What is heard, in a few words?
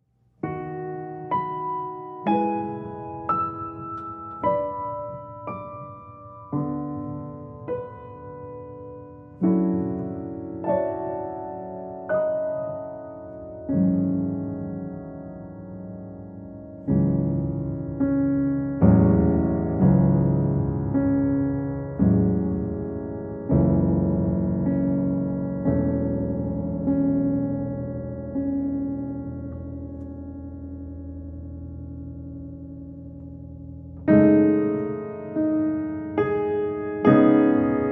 Improvisation